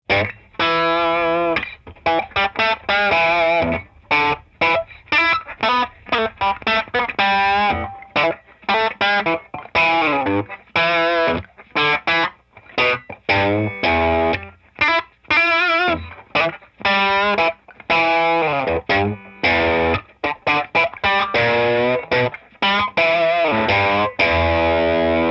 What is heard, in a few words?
LP blues; analog man TB